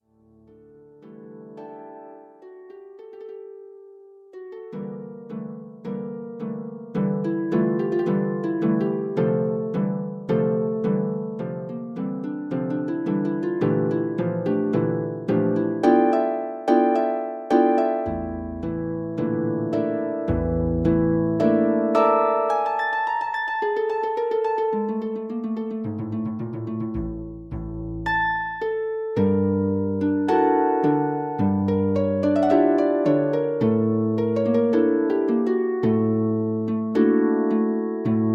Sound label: Harparium tango